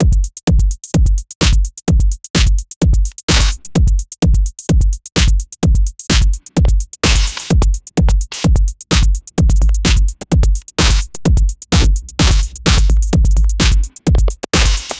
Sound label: beats